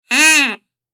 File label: Duck caller sound notification